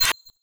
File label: tooth and nail tick